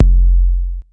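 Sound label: bass drum, Korg ER-1 drum machine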